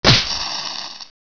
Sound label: Critter Projectile